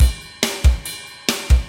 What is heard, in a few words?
metal